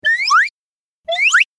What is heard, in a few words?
comical up short